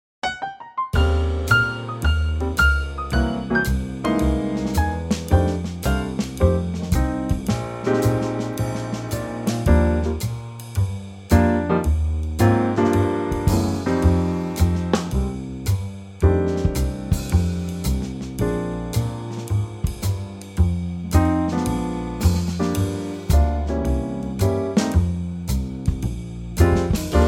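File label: Eb Trio, Backing Tracks for Male Vocals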